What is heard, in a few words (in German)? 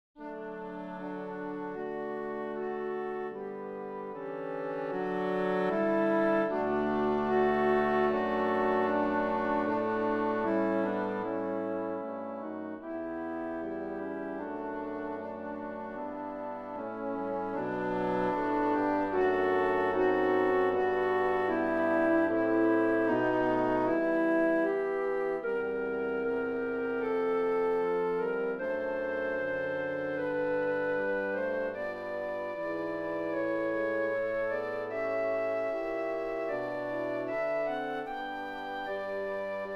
tutti